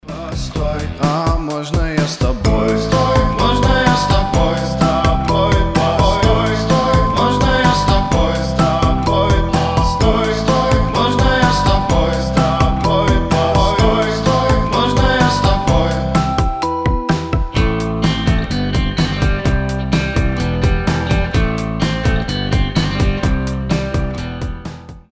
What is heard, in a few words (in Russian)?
rington na zvonok telefon